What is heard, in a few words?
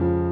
piano low